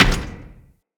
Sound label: Step, Footsteps; Moa